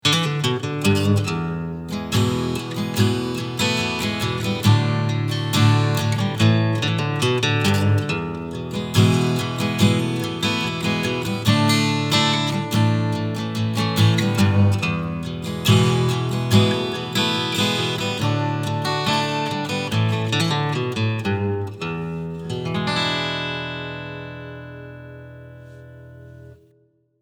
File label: Strumming Picking Mikro